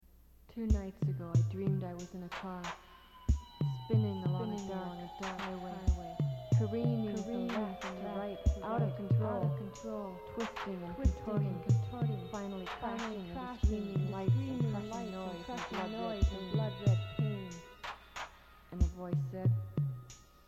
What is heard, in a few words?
wind